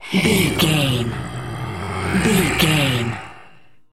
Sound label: zombie voice groan